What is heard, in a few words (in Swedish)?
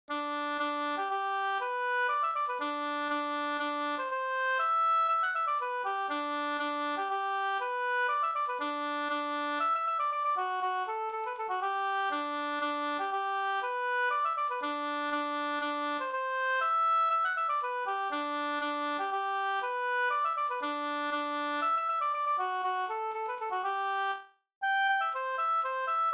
polska stampolska